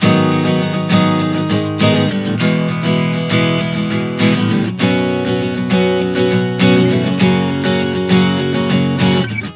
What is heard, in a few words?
G#m-E-Bsus9-F# 100 bpm, acoustic guitar chord progressions